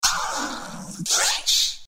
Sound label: ahhhh fresh